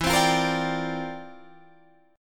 C 11th E

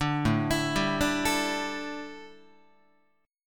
A 7th Suspended 4th, A, down, Guitar

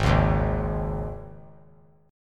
G sharp Suspended 4th G sharp; G#sus4 strummed; JGuitar

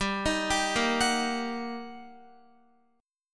G Minor Major 7th Sharp 5th, down, Guitar; GmM7#5; arpeggiated

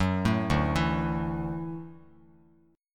D-Major Add 9th D down Bass Standard; arpeggiated; JGuitar

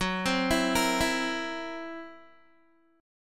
Fsharp 7th Flat 5th down Guitar; F#7b5 arpeggiated

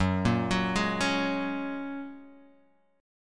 G sharp 7th Suspended 2nd Suspended 4th F sharp

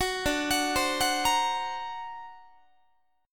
D Augmented 7th D down Guitar; D+7 arpeggiated; JGuitar

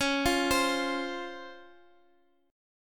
C sharp Major 7th C sharp, Guitar Open F; arpeggiated; x,x,x,8,8,7

